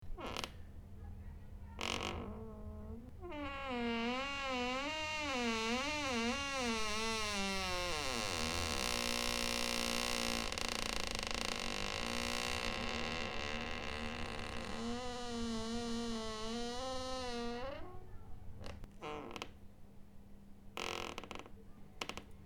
skrip dveri tuda siuda

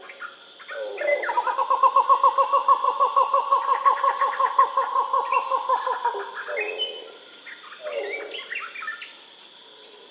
turako bolshoi goluboj

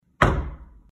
stuk v dver

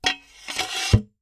minomet

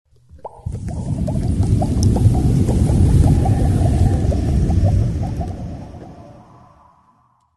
bukvy izchezaut odna za drugoy

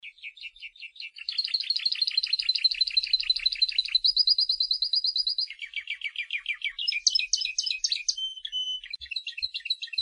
zvuk shebetaniya kanareiki

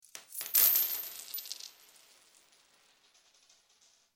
padenie monet na der pol

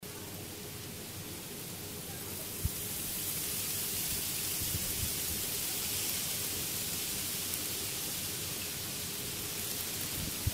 poryv vetra sduvaet listya

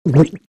glotaet

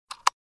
zvuk vykl

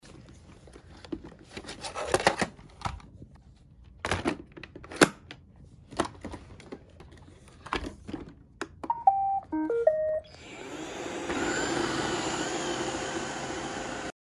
vynuli vsunuli konteiner s musorom i start

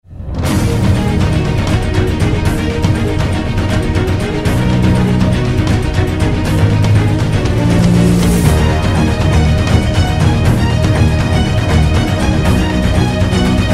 zvuki pogoni

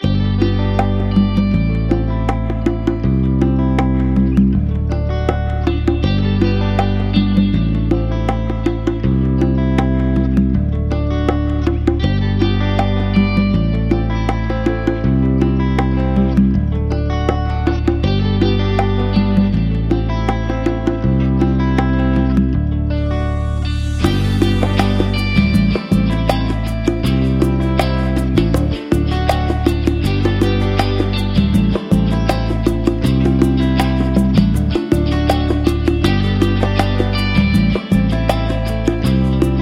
muzyka dlya igr

